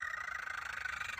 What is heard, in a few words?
bird purring